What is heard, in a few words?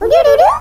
odododo; DS; ML BOWSERS INSIDE; Fawful